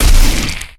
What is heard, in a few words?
Ranged Magical Heavy; Kilta